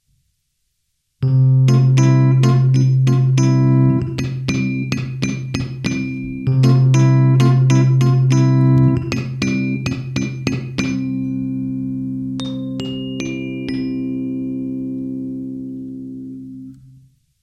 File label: dyno perc, fender rhodes